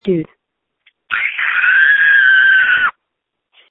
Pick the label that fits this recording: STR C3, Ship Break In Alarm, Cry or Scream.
Scream